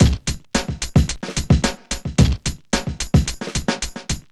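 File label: 110 bpm, Funky loops; Zero-G; Funky Elements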